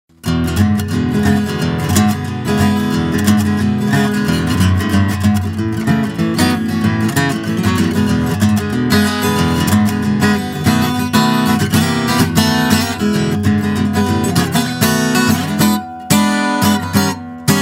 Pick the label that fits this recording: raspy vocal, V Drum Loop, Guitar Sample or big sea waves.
Guitar Sample